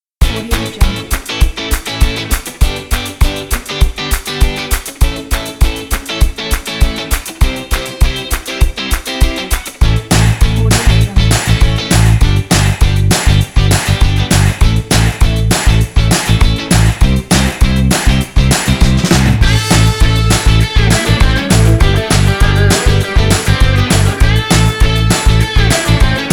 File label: upbeat pop rock; upbeat pop rock kit